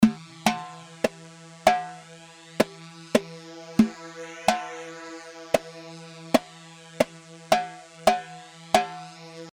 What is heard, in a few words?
Sagol; Sagol 1 صاغول